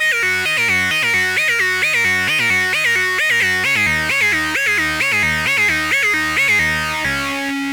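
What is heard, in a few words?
Lead, Wah; Set the Drive switch to Up for additional guitar-like power.; Roland Cloud BOSS Effects Pedals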